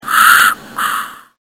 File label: machine